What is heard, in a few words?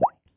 pop